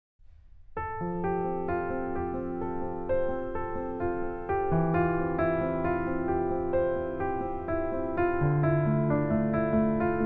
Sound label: Sleepy Piano Crickets